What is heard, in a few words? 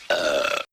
burp